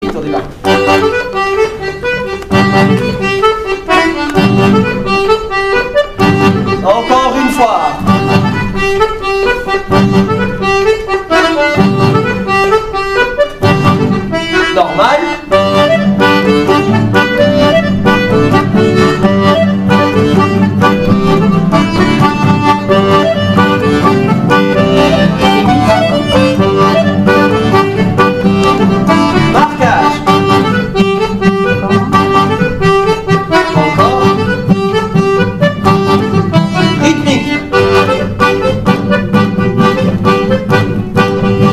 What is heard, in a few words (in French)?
marquages guitares polkas; Finish set; Musique d-ensemble